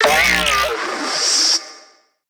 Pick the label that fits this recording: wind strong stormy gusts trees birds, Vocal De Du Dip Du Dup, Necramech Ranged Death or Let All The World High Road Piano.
Necramech Ranged Death